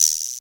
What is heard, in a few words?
Cr-78 Guiro, Dance